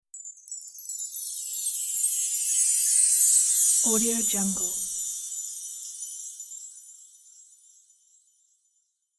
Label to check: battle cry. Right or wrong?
Wrong